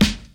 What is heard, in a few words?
clean hip hop acoustic snare sample E key